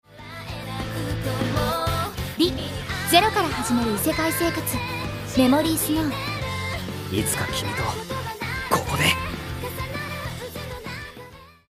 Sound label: applause